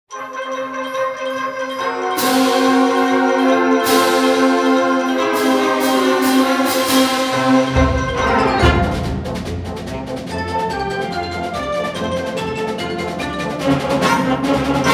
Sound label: Galop